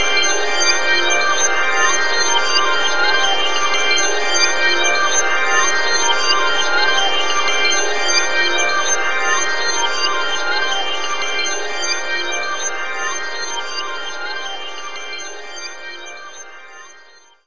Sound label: Extra Pad; zunou ni asekaku game series vol. 1 cool 104 joker setline